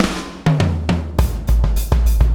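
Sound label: BLOW FILL right, BLOWUP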